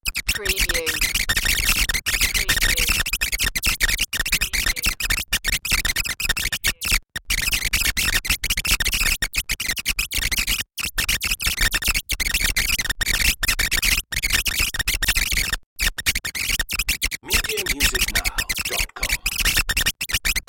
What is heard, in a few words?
data tweet chaos loop